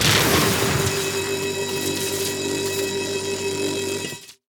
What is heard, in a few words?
tower eldrictch Channelers basic Attack long